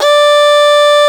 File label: Violin G3